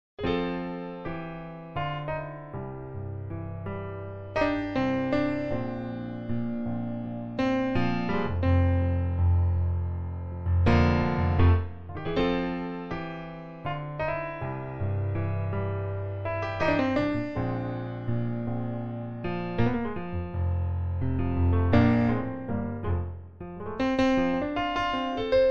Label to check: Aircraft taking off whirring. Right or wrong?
Wrong